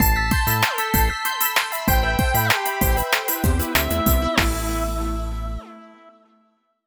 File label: lose; blokus; ps3